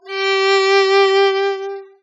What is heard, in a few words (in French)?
violon synt modif ampl phase